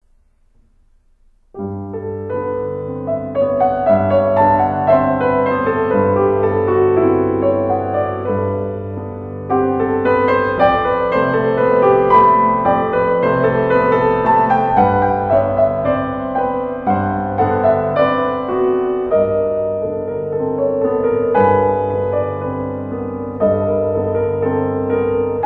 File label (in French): Oeuvres pour piano; Hommage a Debussy; Germaine TAILLEFERRE